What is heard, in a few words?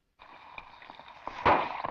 bottle bubbling pop